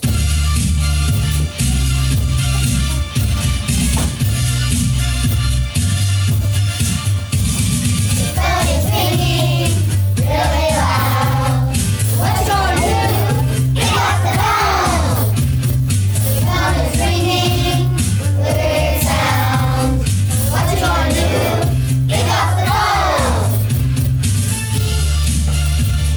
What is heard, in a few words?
ringtone